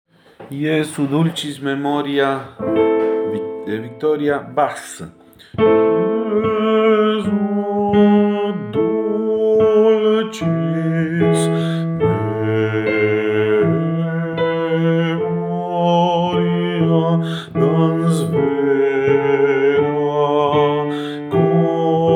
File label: VICTORIA MEMORIA BASSES; Jesu dulcis in memoria